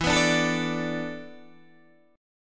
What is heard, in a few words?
E 7th Suspended 4th, Guitar, DADGAD